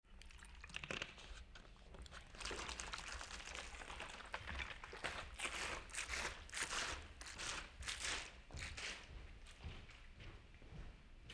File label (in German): Binaural Flasche